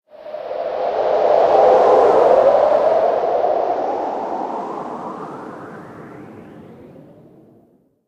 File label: wind gust; Source Engine; tf